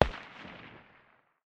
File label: ak 556 far distance, 5.45x39mm; tacz-tweak-pack; taczmechanics